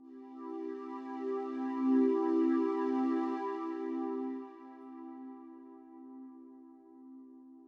SYNTH PAD ETHNO 125 A, Sphere

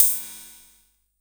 CYM, KR-55

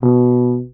TUBA STRA B1, Tuba Straight; Orchestral; DirectWave; Varazdin Orchestral

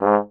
G#1, French Horn Stacato; DirectWave; Varazdin Orchestral

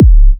Minimal Kick, Drums, Kicks

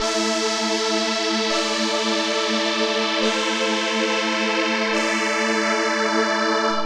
TECHNO PAD 140; Keys

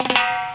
TIMBALE FILL

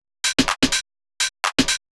HOUSE BEATS 125

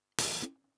Snares & Claps, One Shots

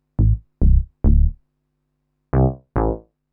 SNTH BASS TEKNO 140 A

